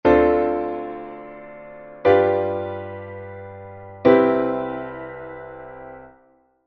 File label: seventh 2 5 1